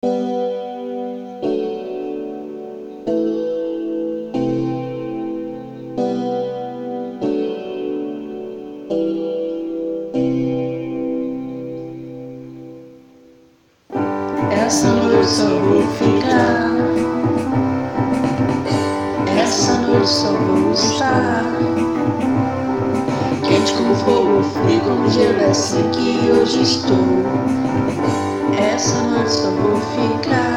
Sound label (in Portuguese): dueto